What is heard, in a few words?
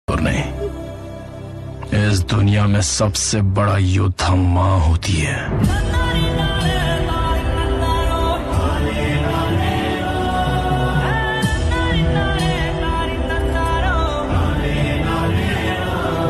mother ringtone bgm ringtones; KGF